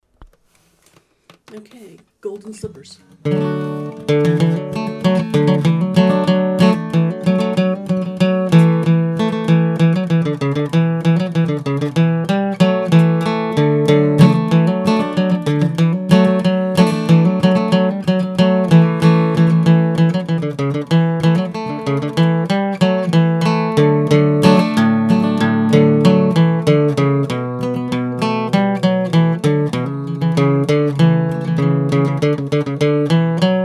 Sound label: Golden Slippers guitar